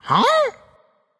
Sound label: nv confused; cupid